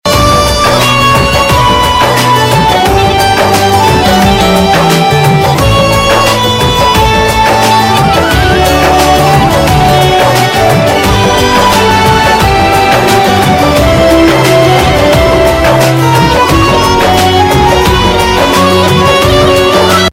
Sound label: Instrumental Tone